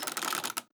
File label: puzzle rotate